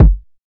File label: warm trap kick drum g key, bass drum single hit tuned to the G note